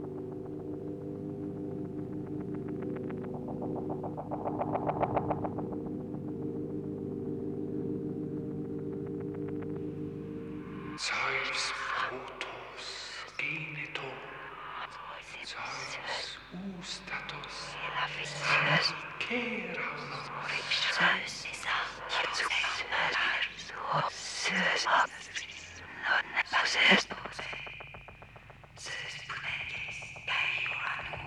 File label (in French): delta son envers choeur; Schaeffer; P Henri; le Voile d Orphee